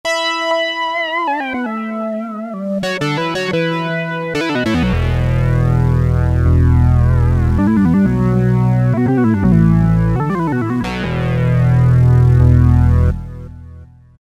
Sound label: Piano Keys Synth, Yamaha A4000; Piano - Keyboards